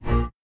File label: social open; tetrio